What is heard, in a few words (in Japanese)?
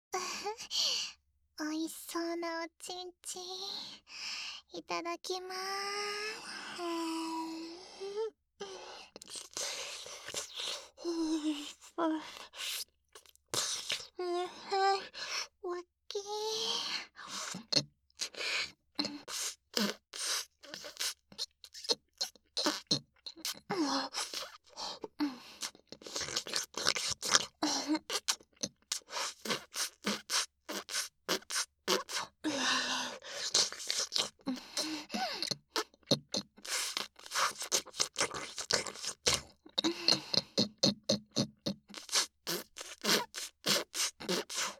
フェラチオ; SEあり